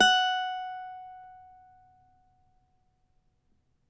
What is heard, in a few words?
CAVAQUINHO F#, Guitars; Spectrasonics; Hans Zimmer Guitars VOL-2